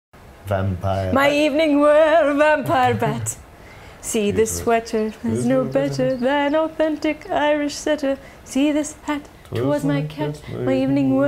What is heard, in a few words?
Singing